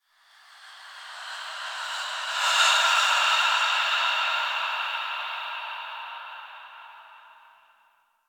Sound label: Breaths; Ambience; Warframe; The New War; Quests; Zariman